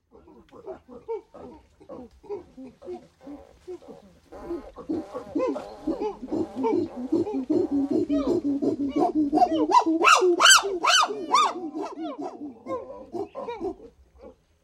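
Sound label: shimpanze golos, chimpanzee voice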